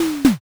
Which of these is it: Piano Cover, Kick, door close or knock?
door close